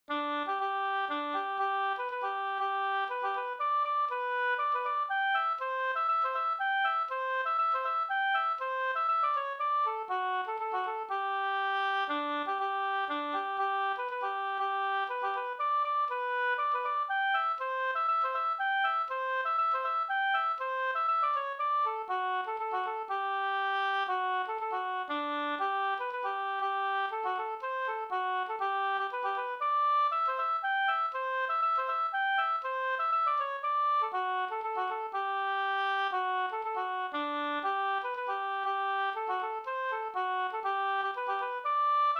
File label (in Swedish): polska Gdur